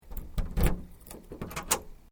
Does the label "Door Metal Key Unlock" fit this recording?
Yes